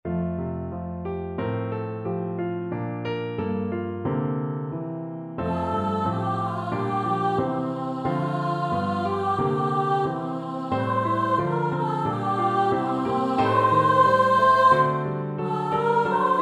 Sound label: solo voice piano F major; the hayloft